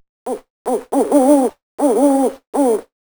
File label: owl